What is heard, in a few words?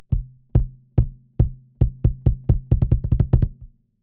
808 kick CURE 100; SubBass Doctor